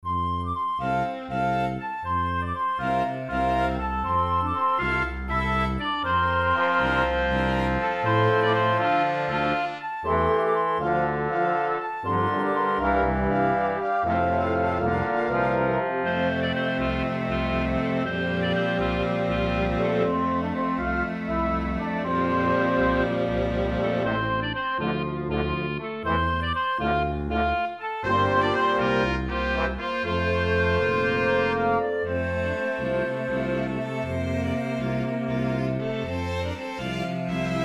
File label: cheerful in f